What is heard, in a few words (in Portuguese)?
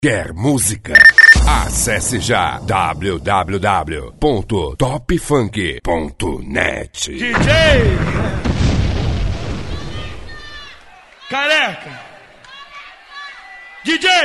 (ao vivo)